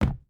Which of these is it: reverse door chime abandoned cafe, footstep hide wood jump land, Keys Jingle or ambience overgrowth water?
footstep hide wood jump land